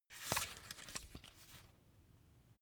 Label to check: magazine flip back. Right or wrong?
Right